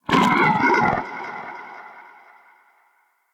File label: Shrimp Vocal Death, Creatures; Vocals; Baliq Shrimp Glade